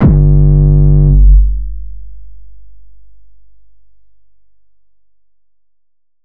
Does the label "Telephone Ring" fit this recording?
No